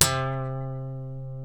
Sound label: D, funk guitars; Spectrasonics; Hans Zimmer Guitars VOL-1; TAKA FUNK